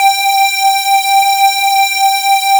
S-LEAD

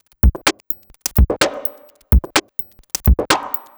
127bpm, House Drumloops

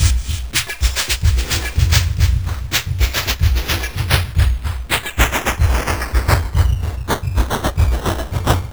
Industrial Loops, UZINE FX

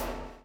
CHAIN; Spectrasonics - Backbeat

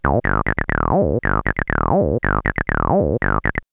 303 Loops; X-Static Goldmine

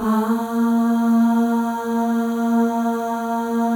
AHS, FEM; Spectrasonics; FEM.1 AHS

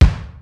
XD-5, DRUMS PERC, BOSS

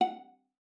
F#5, VIOLA PIZZ; Xsample VOL-5

